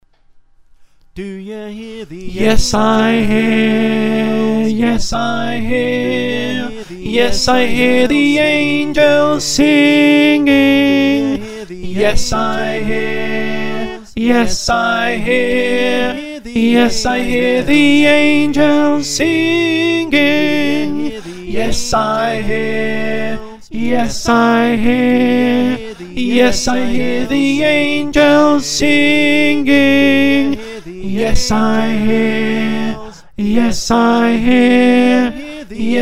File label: Alto